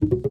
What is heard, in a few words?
stop